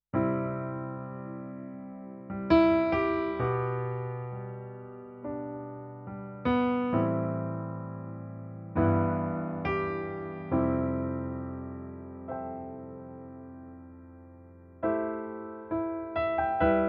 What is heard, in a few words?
NU1 Concert Grand